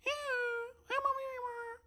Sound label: Grub Wave; Hollow Knight (gamerip) (2018)